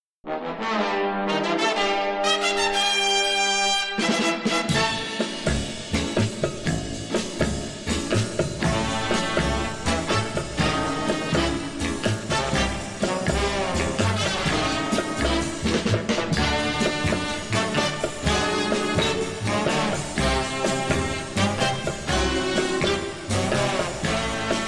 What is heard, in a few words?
strings with brass